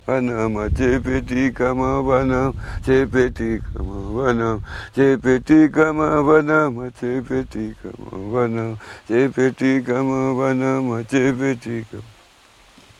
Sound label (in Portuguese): timbiras